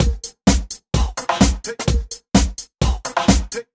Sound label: House Beat, 128 BPM